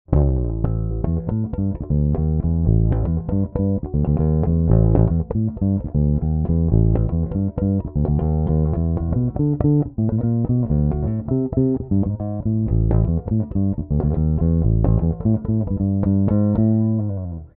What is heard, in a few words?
Neck Passive Treble Rolloff